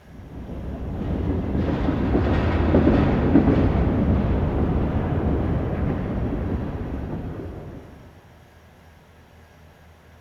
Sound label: train distant pass; ambient